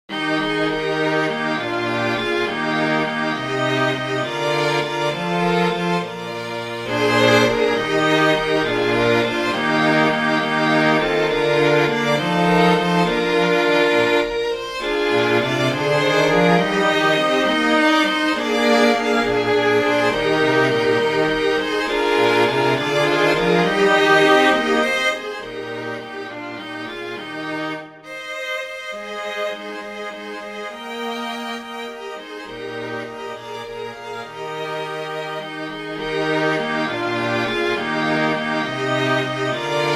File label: Serenade Tutti; A racuei